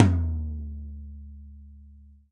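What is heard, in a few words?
14 tom, Drums&Percussion; E-MU Producer Series Vol. 7 – Old World Instruments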